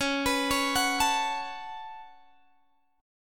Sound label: C sharp 7th Suspended 4th Sharp 5th C sharp, Guitar Open G